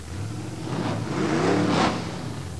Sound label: AUTO, car sounds